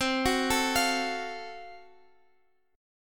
Gb Minor Major 7th Flat 5th, chord

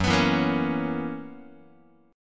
Gb 11th, Guitar; strummed; 4A,4E,5A,5D,5G#,6C#

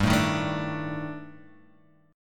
Eb Major 7th Flat 5th G

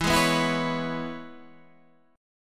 C sharp Minor 7th E, chord

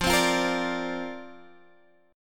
D-Minor 7th Sharp 5th F, chord

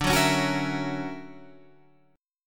D Augmented Major 9th down Guitar Half Step Down

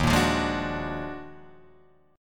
D sharp Minor Major 11th, Guitar, Drop D

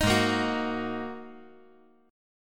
G sharp 7th Suspended 2nd G sharp; G#7sus2; strummed; 8,8,6,9; JGuitar

